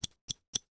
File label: industrial tools wrench adjustable 6 inch adjuster turn x3; sound effects one